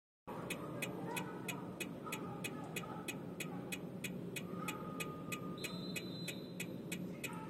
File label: ticks; тикающие звуки; УПСЛ-М; В некоторых случаях во время переговорной связи в динамике могут наблюдаться тикающие звуки